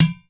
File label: WATER DR, percussion; drum